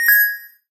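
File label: thin light beeping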